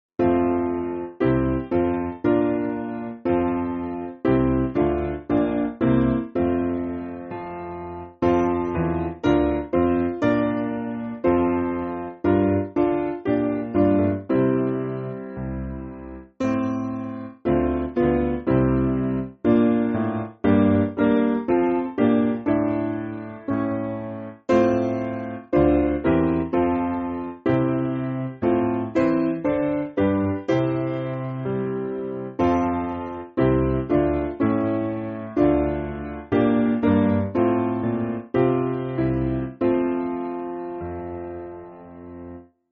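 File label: Piano, Music Backing Files for Churches; Turn Back O Man; Old 124th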